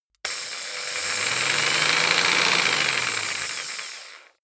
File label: jigsaw engine slow medium length